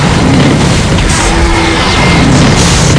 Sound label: Impact Loop; Unreal Tournament Demo; BotPack